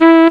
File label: saxophone; Sound Effects for Multimedia - Volume 1; horn instruments